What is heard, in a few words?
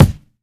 2000s wet bass drum single shot d key, kickdrum sound tuned to the D n